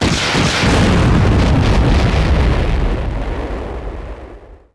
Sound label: explo starfire triple; impacts explos